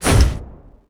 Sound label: leap up